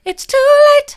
it's too late soft, vocal

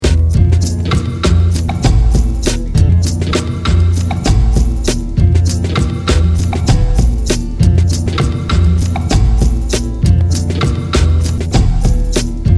bandoneon

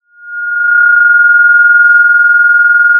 Sound of a phone ringing

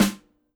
Snr, drum

